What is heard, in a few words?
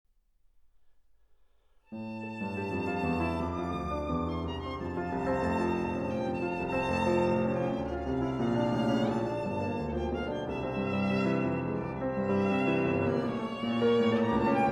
play violin